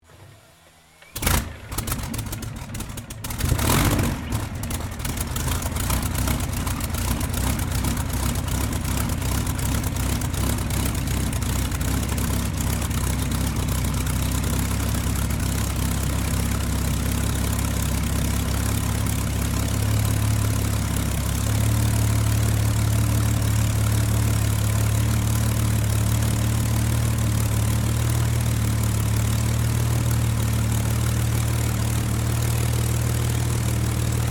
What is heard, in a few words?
AIRCRAFT SPITFIRE ENGINE STARTUP STEREO, Rolls Royce Merlin engine; Airplanes (Sound Effects:Vehicles)